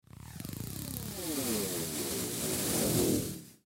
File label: Electrical Tape, Electrical Tape Peel Off Roll Long; Offices; Foley; Blastwave